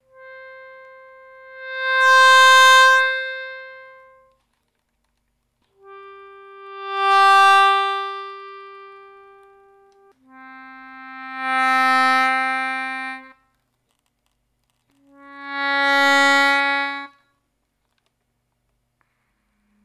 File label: reed response